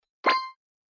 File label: one Win